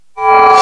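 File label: BELL reverse, percussion kit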